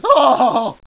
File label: pain; svencoop; decay